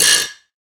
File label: grind, manhack; npc